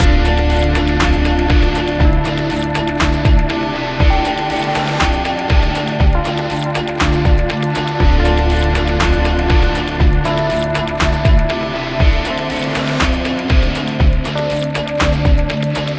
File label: Battle Rock Loop